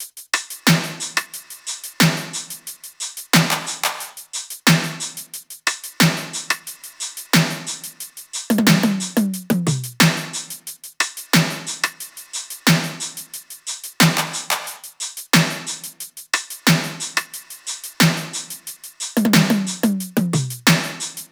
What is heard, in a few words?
top 90, Drum Loops; SD MIDNIGHT Sample Pack; Slate